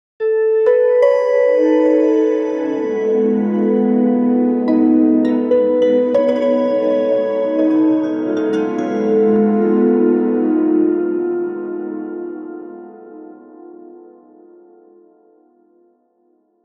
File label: music